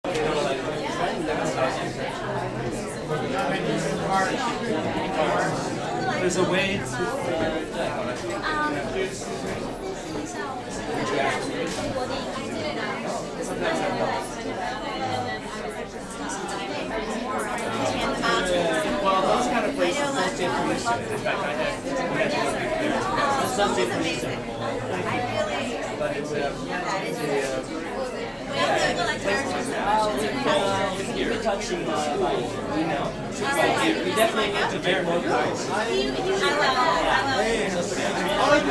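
Crowd Interior Crowded Small Theater Lobby Nyc Usa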